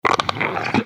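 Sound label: damage, basilisk; basalisk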